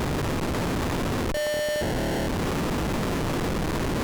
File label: poc 300hz